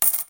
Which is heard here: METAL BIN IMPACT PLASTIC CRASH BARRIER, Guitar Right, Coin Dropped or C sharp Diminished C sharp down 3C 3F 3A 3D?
Coin Dropped